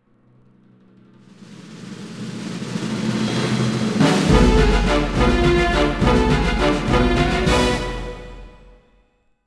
Crescendo

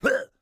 Pirate Birdnest

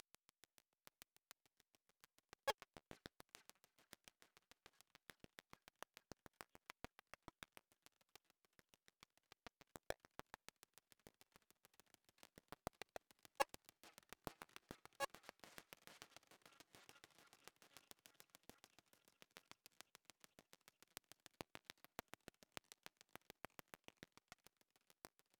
Music NOISE MOVE; Music B1 NOISE MOVE Phase01; Cryptical Path; ps4 ps5 switch windows xbox one xbox series xs gamerip 2025

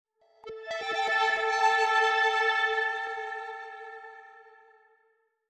Progression Stinger; viewfinder; Botanist

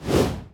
Finalboss Prepare; Folk Hero

